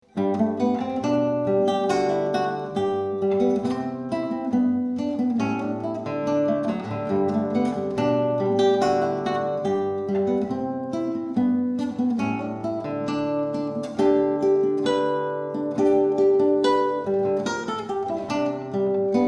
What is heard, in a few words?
gavotte